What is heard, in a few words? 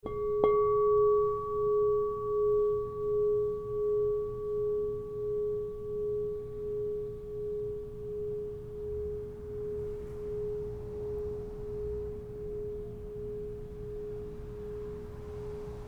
Crystal Tones Singing Bowls